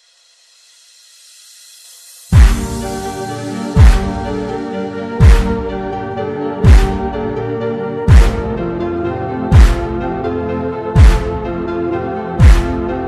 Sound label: slowed reverb